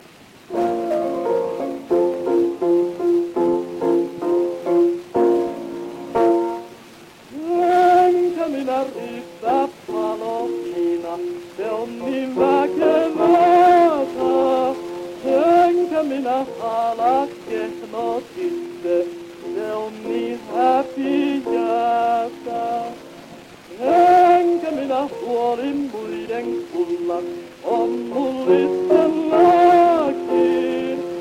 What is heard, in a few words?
Gramophone